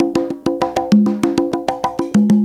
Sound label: Conga, Latin Percussion